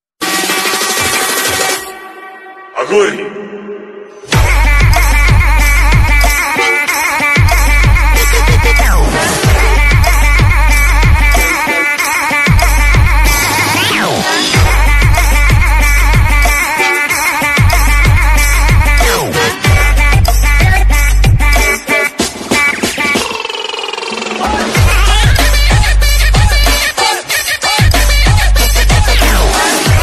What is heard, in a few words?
Mobile Ringtone